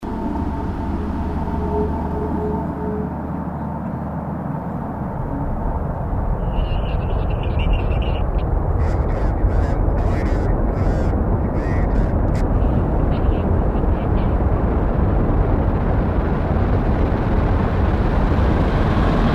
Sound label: spaceport